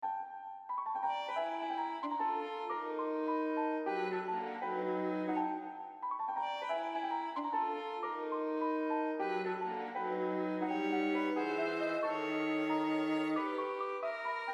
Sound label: in d major for piano violin viola; amedeo berezet pensiero